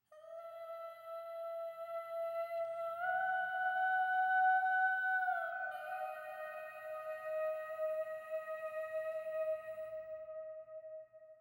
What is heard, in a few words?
Vox Only